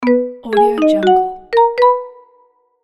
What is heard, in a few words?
cute marimba logo